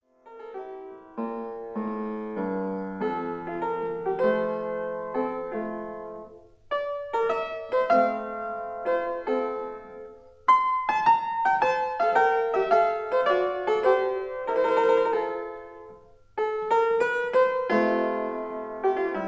Piano Sonata Andante, Mozart Piano Sonata No 7 K309 mvt 2